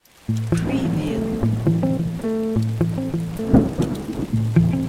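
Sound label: Arpeggio Bastaki Am 105BPM, electric guitar; 6-8; beatcraftpro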